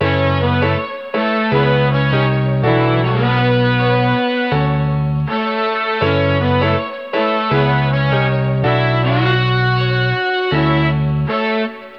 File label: Classic Melody Loop 80 BPM A Maj, Melodics; Cymatics - Eternity Sample Pack